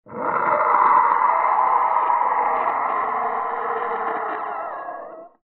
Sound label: monster moan